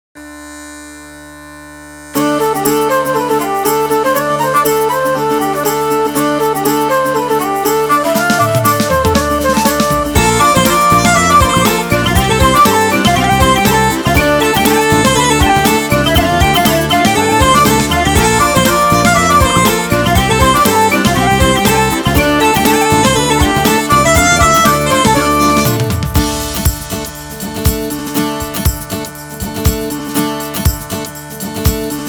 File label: karaoke